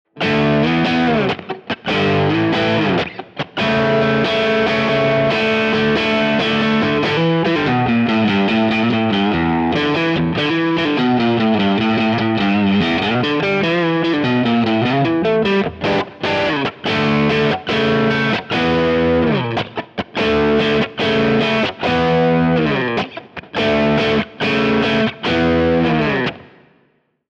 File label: harley benton st 62 mn vw